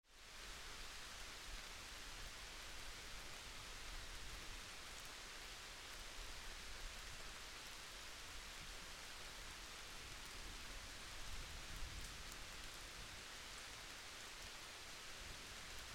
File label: Summer Thunderstorm rain under tree canopy; Ivo Vicic; Rain Under the Canopy in a Summer Thunderstorm